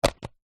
mains power plug plug into extension block socket australia; household